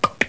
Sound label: click Click; National Lampoon's Blind Date